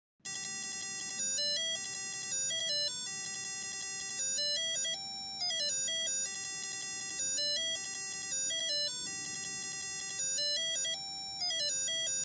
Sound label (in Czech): ukazka zvuku alarmu